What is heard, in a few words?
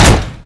box metal impact, debris